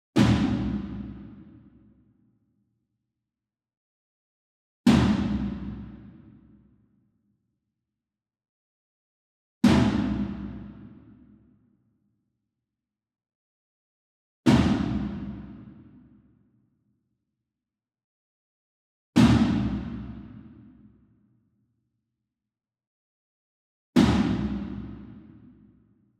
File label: ENSEMBLE DRUM Concert Toms Hit Far; boom library; Cinematic Strikes Construction Kit